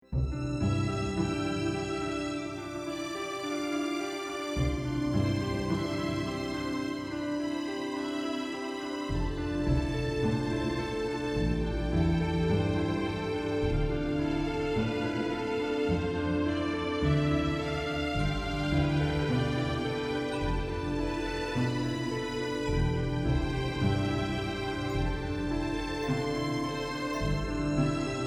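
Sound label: Pachelbel strings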